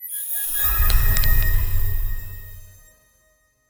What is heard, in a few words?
dashboard button soft mag; time travel